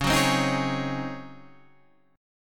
C sharp Major 7th Suspended 2nd Suspended 4th C sharp